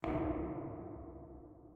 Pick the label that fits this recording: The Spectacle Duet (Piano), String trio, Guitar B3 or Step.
Step